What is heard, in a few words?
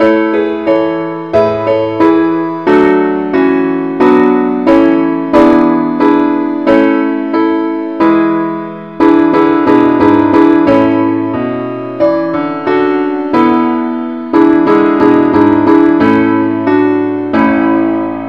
keyboard Only key a; Welsh tune; Gwalchmai is a Welsh tune, named for the town of Gwalchmai